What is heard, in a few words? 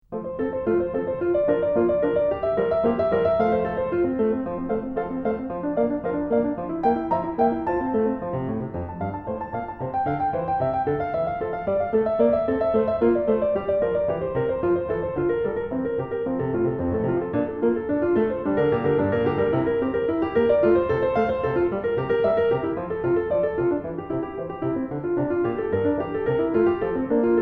Prelude in F Major, piano; BWV 927